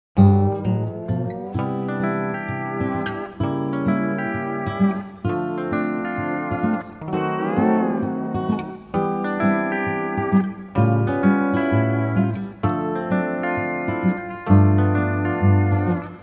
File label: Pedal Steel Guitar